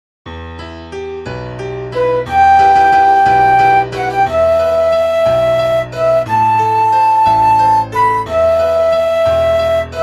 balada em mi menor

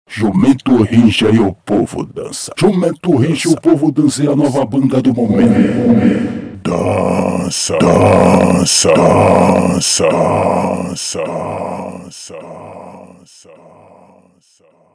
banda jumento rincha e o povo danca; cgmr producoes

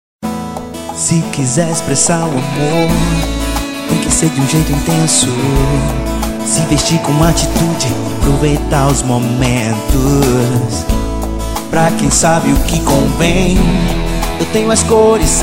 jingles; dias dos namorados color stor

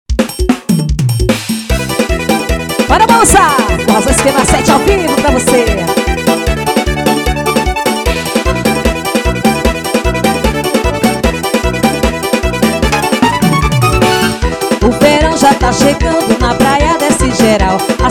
tocando todos os ritmos

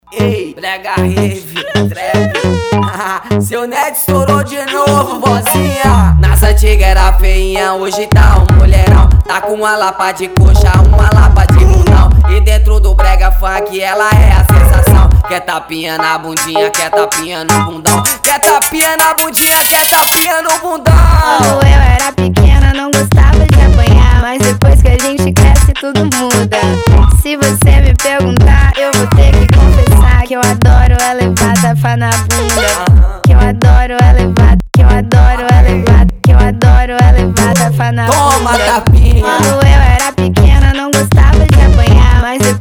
brega funk

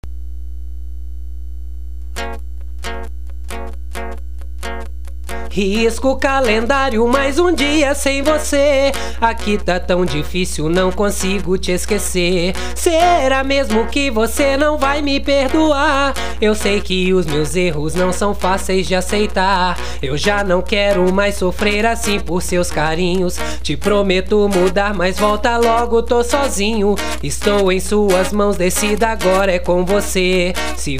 universitária

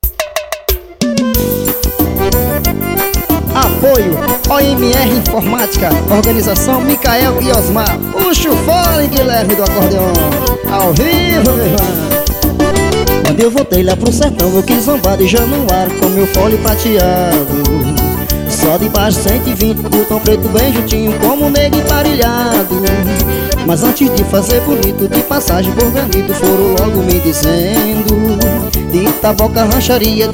acordeon

